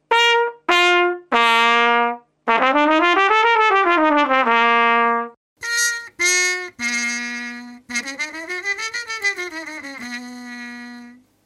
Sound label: tpt mute compac practice; faxx